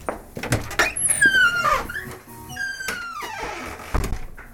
Open Door

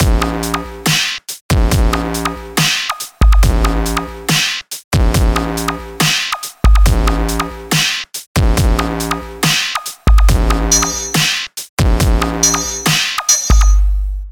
Dubstep Beat

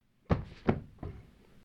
Foley Body Fall Impact

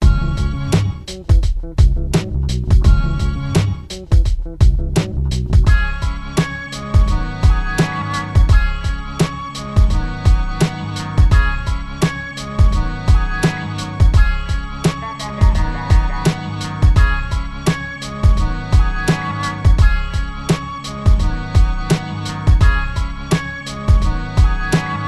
Sunny Hip Hop Loop

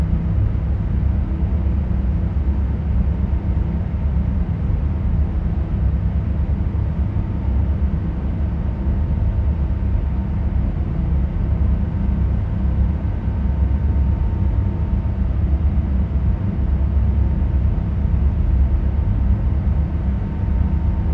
Sonic Ferry Interior Loop

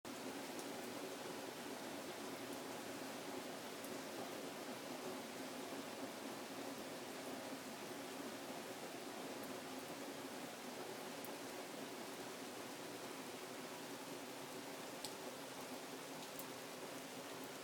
Gentle Rain

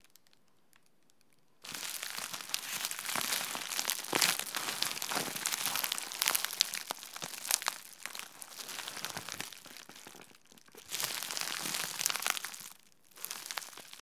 Squished Bubble Wrap

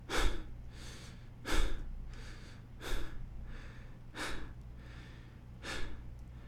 Men Pant

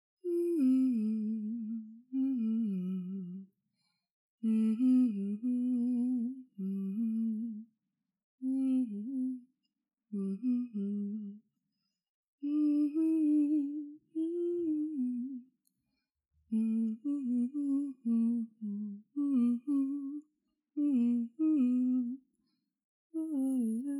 Humming

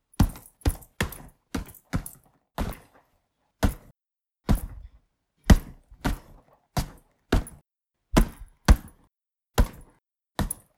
Hits Fight Punching

